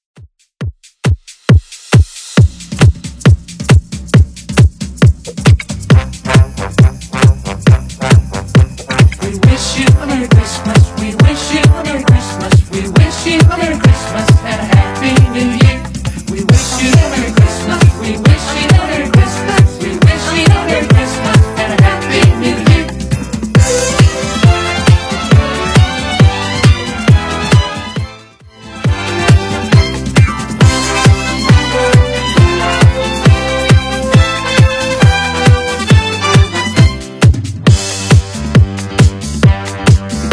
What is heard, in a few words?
Christmas Redrum 136bpm